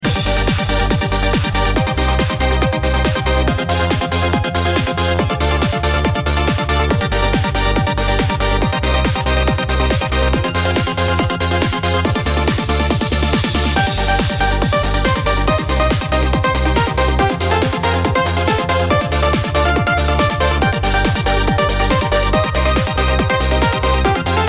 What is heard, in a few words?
battle intense, music; freepixel